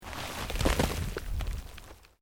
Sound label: Earth Loose Move Short